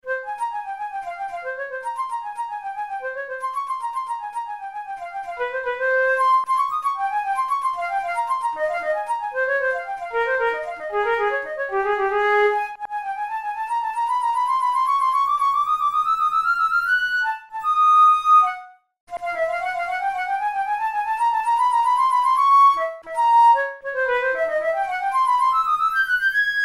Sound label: melodic exercises, flute tunes